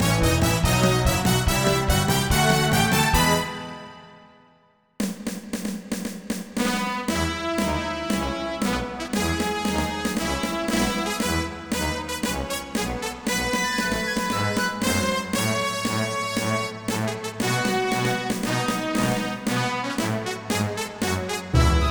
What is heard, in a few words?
Battle Victory; Rhapsody A Musical Adventure; ps1